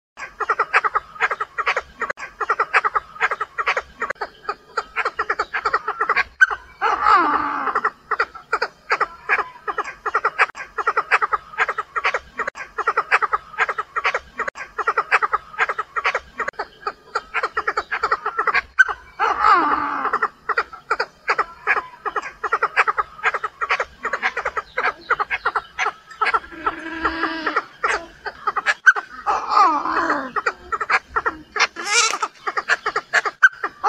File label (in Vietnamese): Tieng chim Da Da mai goi trong